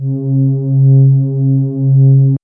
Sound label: MOOG; AMG - Vince Clarke Lucky Bastard